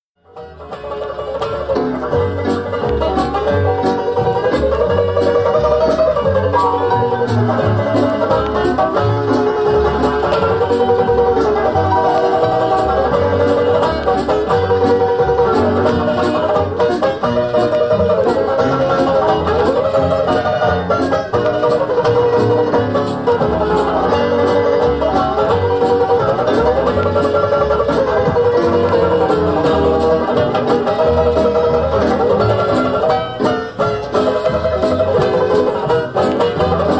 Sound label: banjo